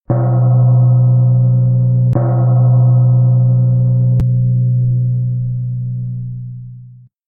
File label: Gong